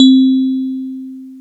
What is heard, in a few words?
DX PIANO